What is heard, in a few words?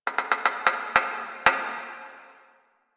Metal Creak Small; Ambience; Warframe; The New War; Quests; Zariman